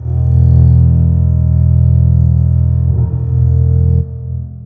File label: So low bass E